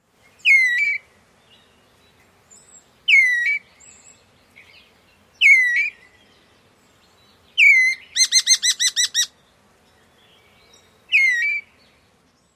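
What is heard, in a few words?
rose-breasted grosbeak turlee calls immature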